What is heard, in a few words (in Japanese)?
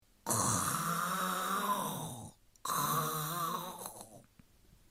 いびき